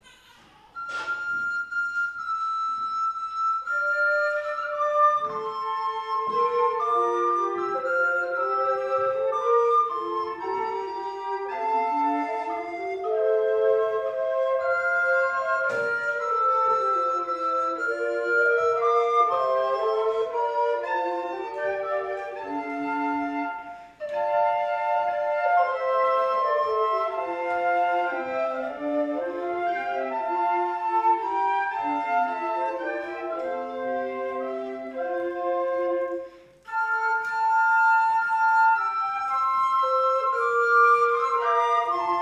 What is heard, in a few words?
Instrumental; Gottesdienste zum Nachhören; Jubilate